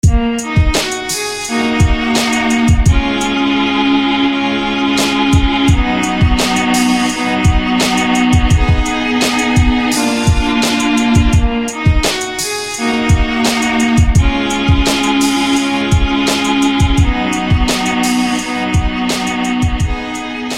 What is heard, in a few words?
White Viola